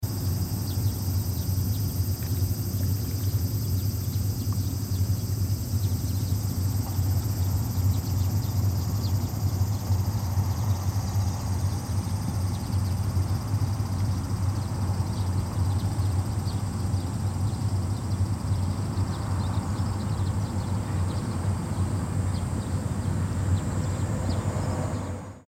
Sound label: cicada